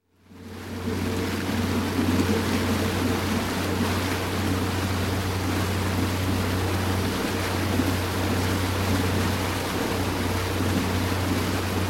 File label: Boat Inboard - Travelling Slowly, Marine; Sound Ideas; Series 2000